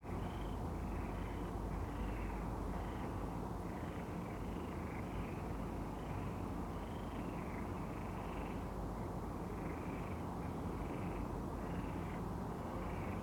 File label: City Park Ambience At Night